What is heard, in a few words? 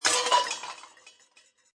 Crash Pots And Pans, Cooking pot cutlery crash, pot drops and cutlery; Crash Pots and Pans 04. Cooking pot cutlery crash, pot drops and cutlery rattles on impact.; Crash Sound Effects; Lynne Music